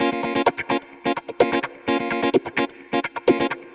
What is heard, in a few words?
Electric Guitar - A# min, 128BPM; Electric Guitar Kit; Vengeance Samples; Special Sounds